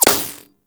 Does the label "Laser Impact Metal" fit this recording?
Yes